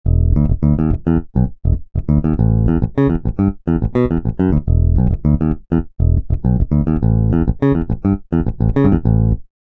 Finger Bs; fantom-x8; patch demo

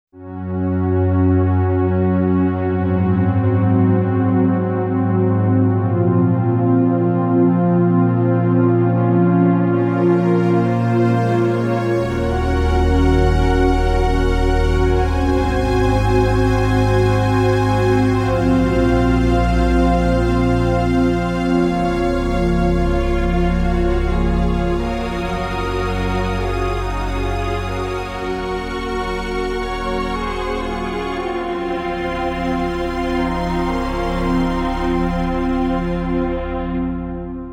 vintage synth; xp-80; roland; Multi-patch demo; roland demo cd-3

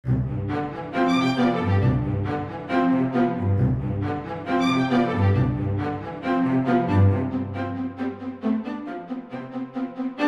Fast Stac sw; xv-5080; SRX

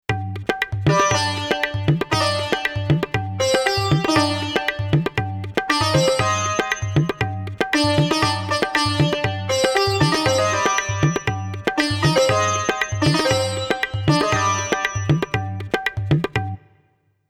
Tabla and Sitar; xp-80; roland; Tabla&Sitar patch demo; roland demo cd-3

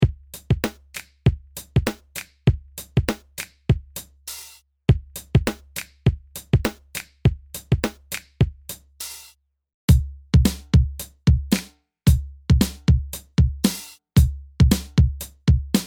HipHop Kit; fantom-x8; patch demo

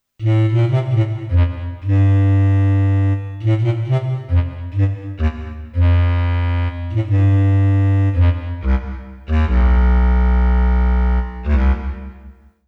Bs Clarinet; xp-80; roland; roland demo cd-3

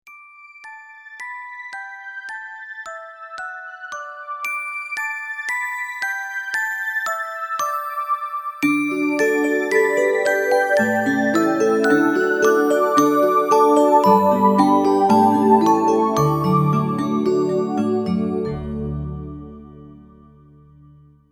Sylky Bells; fantom-x8

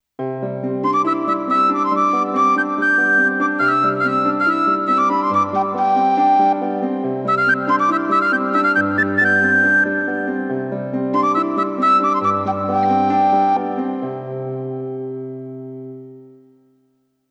Sinking Duo; patch demo; 16-bit, 44.1 kHz stereo; xp-80; roland; roland demo cd-3

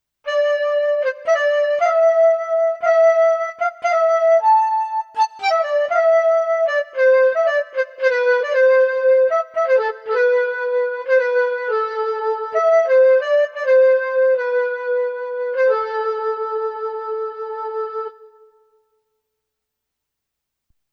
2 Flutists; patch demo; 16-bit, 44.1 kHz stereo; xp-80; roland; roland demo cd-3

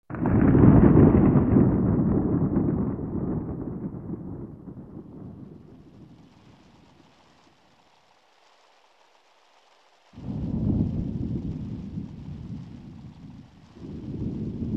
Rain Storm; xp-80

